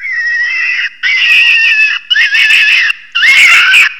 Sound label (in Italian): coniglio, versi degli animali; animalandia